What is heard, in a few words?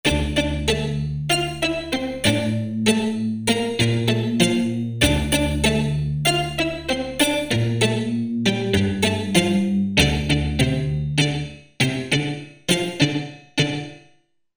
2 voices; Korg MSC-12S Synth 3